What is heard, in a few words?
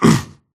grunt hits; Titanfall 2